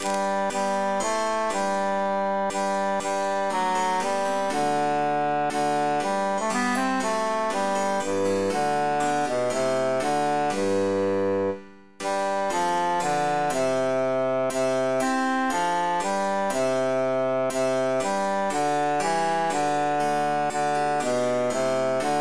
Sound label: bassus emphasized; sing we and chant it